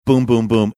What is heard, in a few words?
boom boom boom